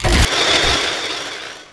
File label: war attack; NECROMETA; Mob